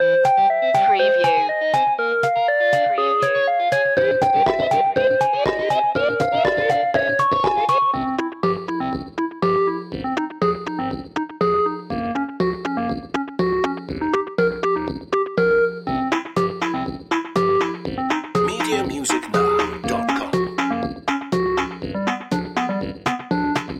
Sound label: 8-bit boss loop